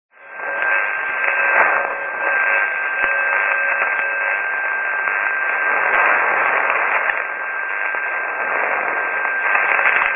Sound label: NDB, 326; BZO